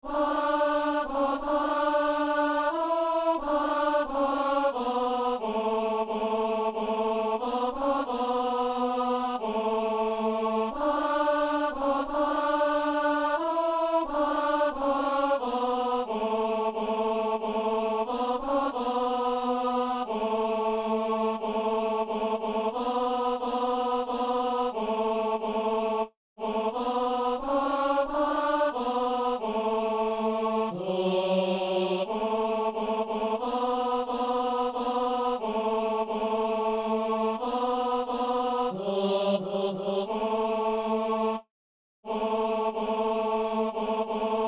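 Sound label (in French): ténor; Saint-Denis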